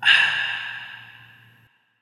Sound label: contented refreshed sigh; soundscrate